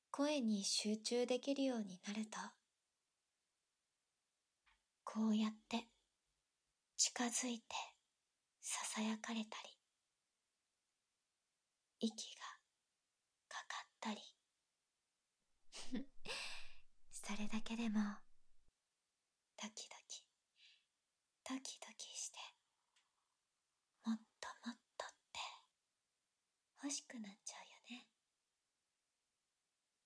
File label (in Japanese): マルチトリガー 初級：耳舐め・吐息; 【催眠音声 脳イキ】マルチトリガー～心を満たす至高の快楽体験～【連続絶頂 依存性有り】※男性向け版